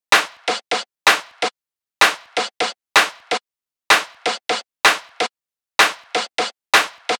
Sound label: 127 drum top loop heavy